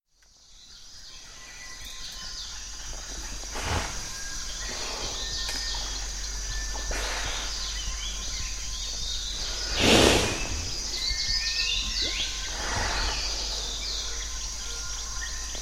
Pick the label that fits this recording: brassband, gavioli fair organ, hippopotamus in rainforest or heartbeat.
hippopotamus in rainforest